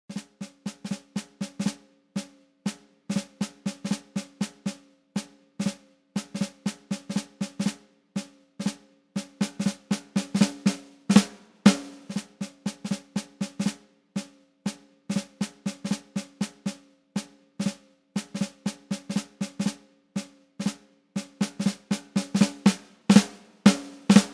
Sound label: snare drum 80 bpm; etude no 14